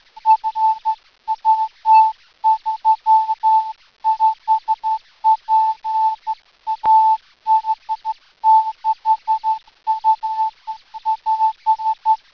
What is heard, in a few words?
47GHz